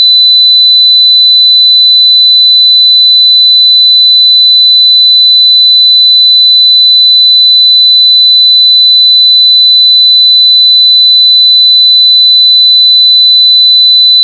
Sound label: sine